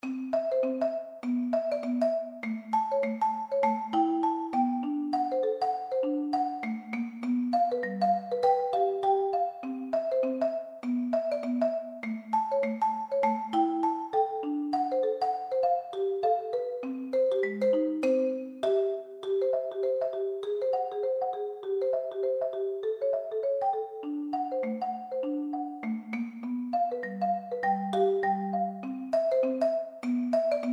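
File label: silver sand rag, marimba; percussion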